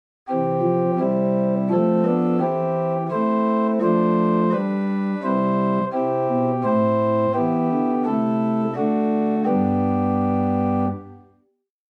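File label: Hw Sesquialtera arp; Grace Lutheran Champaign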